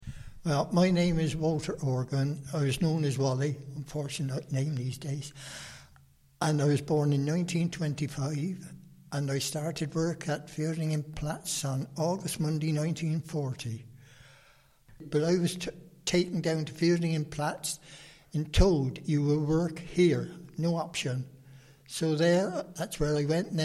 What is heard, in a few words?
Organ Introduction